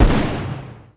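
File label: riptide explosion; Tanks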